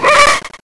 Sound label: Screech; amyvswalker2